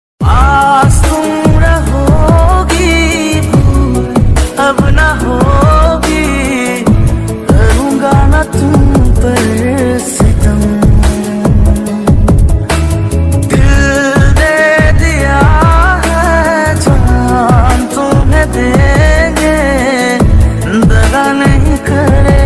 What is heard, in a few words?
instrumental; tranding ringtone